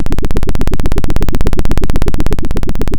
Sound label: pop, Sound of a helicopter